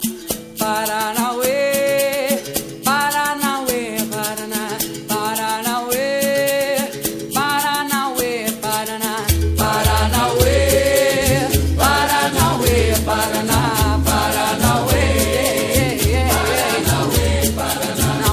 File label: Brasil Capoeira